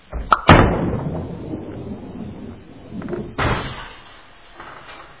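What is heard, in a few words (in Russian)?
бросок шара и попадание в цель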